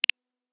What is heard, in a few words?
slick notification